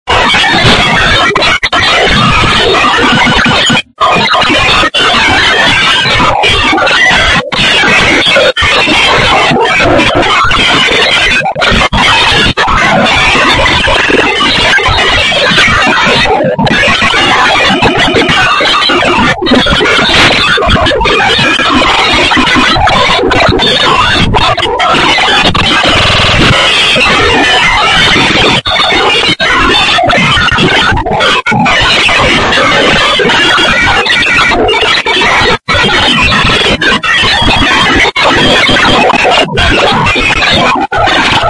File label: computer feedback